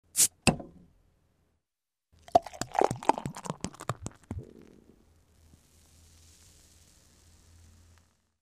otkryvajut kryshku i nalivajut v bokal

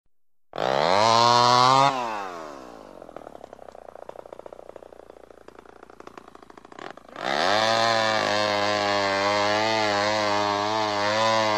zvuk rabotajuschej benzopily

